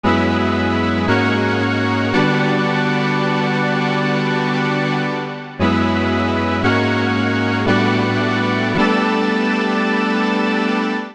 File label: E Minor 86 bpm; MIDI Clips; Tonal; Accompaniment; 16 Pennies; Ableton; Factory Packs; Chop and Swing